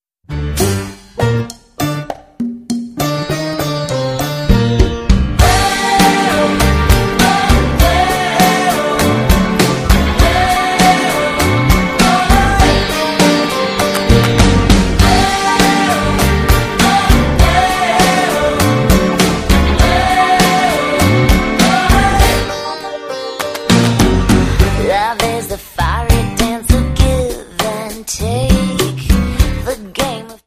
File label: tango